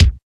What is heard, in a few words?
KIK, Cheez Kiks; Club-50 - Foundations Roland